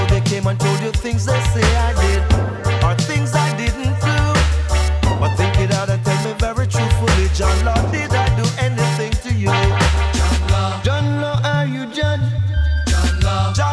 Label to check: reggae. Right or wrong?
Right